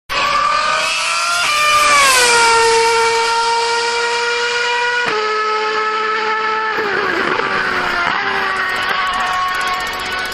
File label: sound of speed